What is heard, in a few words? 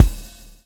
SWNG